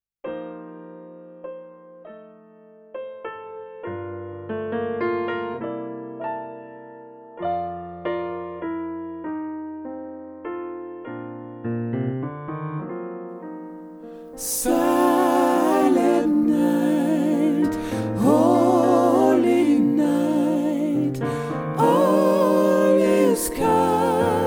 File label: Tutti, Gospel; Silent Night